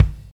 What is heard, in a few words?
good bass drum sound g key, Royality free steel kick drum sound tuned to the G note.